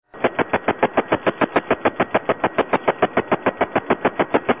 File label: spooks lawnsprinkler, HF; Unusual Interference